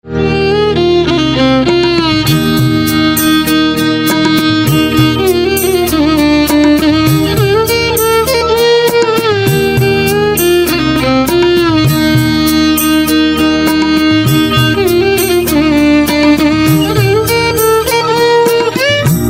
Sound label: Violin